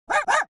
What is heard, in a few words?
dog bark; blondie girl productions; television